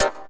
dance chord

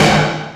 Snare & Long Reverb; percussion; SDs; SamplePool3

